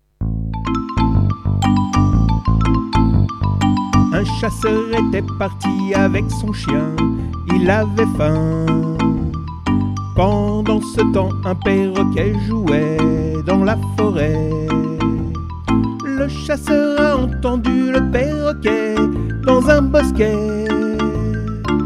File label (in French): Perroquet Synthé